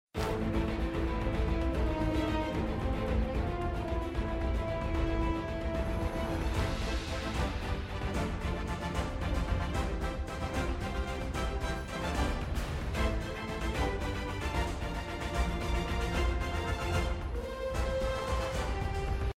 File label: Music Battle; Pirates of the Caribbean Master of the Seas